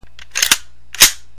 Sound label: rifle cock; weapons